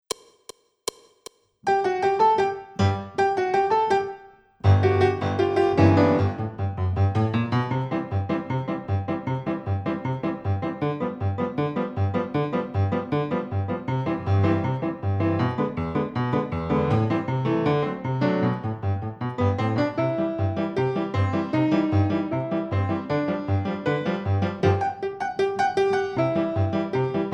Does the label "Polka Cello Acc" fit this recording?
Yes